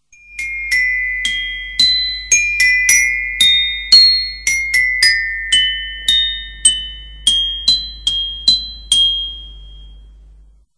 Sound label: Glockenspiel Percussions; W. A. Mozart; extrait de la Flûte enchantée